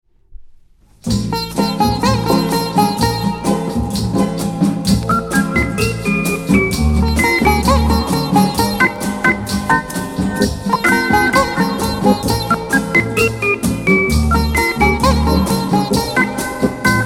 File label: sitar cha cha